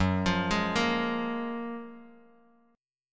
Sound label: Gb Major 9th, down, Bass Standard; GbM9; arpeggiated; JGuitar